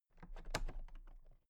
toolbox latches open very soft

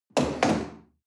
chair wood place slide hard

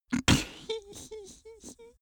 cartoon male giggle snort high

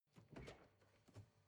refrigerator door open soft

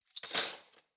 cutlery spoons drawer place

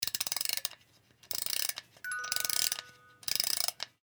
music box winding up

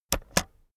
keyboard external space press slow heavy

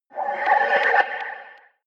rise wind voice

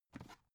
dvd case pick up soft

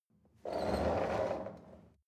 chair wheel roll on floor soft

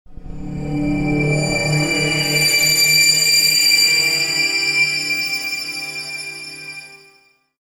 feedback ambient

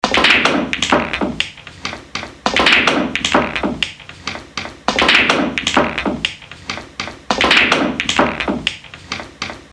billiard loop

